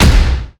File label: Short Hit Stance